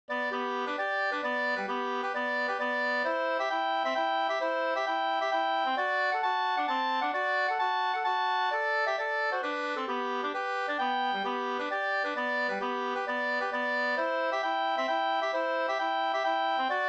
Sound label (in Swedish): polska trestegspolska